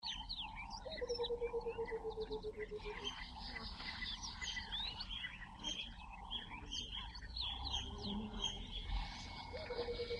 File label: Zambian Dawn